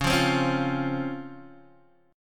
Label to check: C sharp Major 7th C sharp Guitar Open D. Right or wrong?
Right